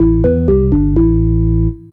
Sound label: DEEPHOUSE BASS 125 A